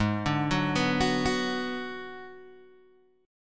Ab Minor 7th Flat 5th Ab, down, Guitar, Open G

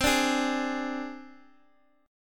C Suspended 2nd Sharp 5th, down

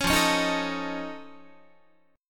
F Augmented 7th F Guitar, chord; F+7 strummed; 16,13,16,13,13,14

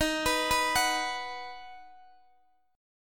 D sharp Minor Sharp 5th D sharp, Guitar DADGAD

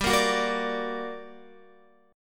G Minor Major 7th, down, Guitar; GmM7 strummed; JGuitar

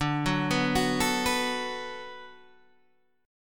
D 6th D down Guitar Open D, chord

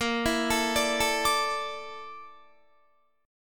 Bb Major 7th Flat 5th, Guitar; arpeggiated; 18,19,19,19,21,21; 4E,4A,5D,5G,5C,6F; BbM7b5

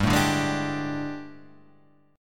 G Suspended 2nd Add Flat 9th G, chord; Gsus2addb9; sus2addb9:1,2,(5),b9; 3,0,0,1,3,3

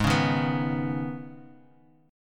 Db 7th Suspended 2nd

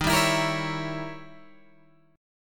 Eb 7th Flat 9th, Eb, Guitar, Open G

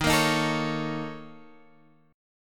A sharp Minor Major 7th Double Flat 5th D sharp

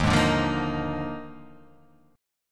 Eb Major 7th Suspended 2nd Suspended 4th Eb down; strummed; chord; JGuitar

